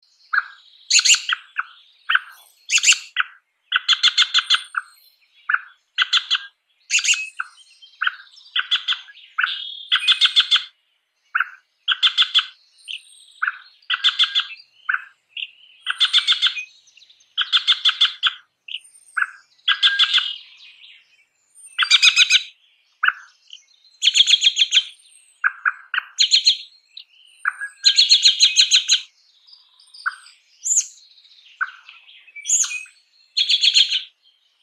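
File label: American Robin Sound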